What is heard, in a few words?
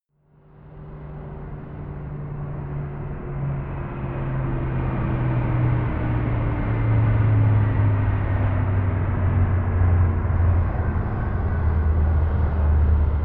plane bombardier learjet flyby distant; flugzeuge verkehrsflugzeuge; verkehr transport